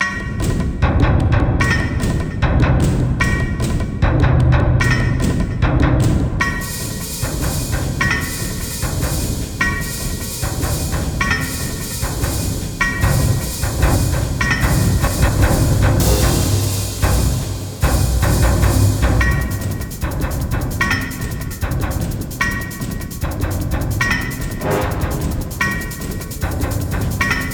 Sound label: great dragon appearance, Percussive theme; 35sec loop; Spring/Spring Enterprises